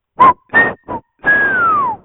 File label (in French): sifflet